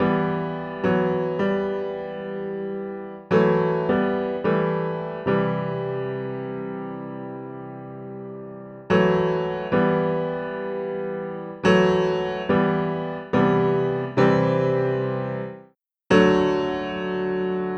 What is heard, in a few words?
Country Piano (Verse 1 Alt); Digidesign; Pro Tools Essential Loops